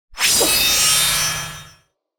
casting generic; psfx

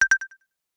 marimba tone; sonniss gdc

